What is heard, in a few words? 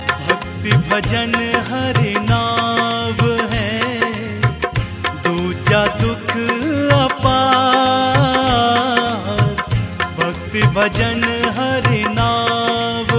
Bhakti Bhajan; Hari